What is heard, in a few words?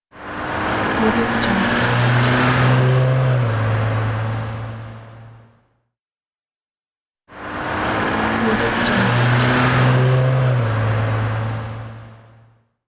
car pass by